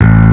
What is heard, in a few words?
bass; osu